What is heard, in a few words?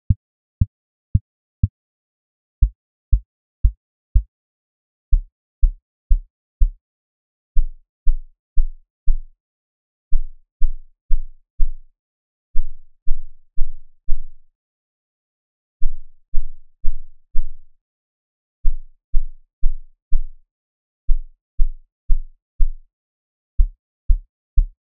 sponk 100hz 12hz 2ch